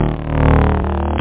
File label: vibro buz; megatron